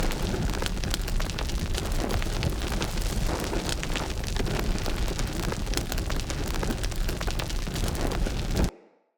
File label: Torch Fire Loop; Puzzles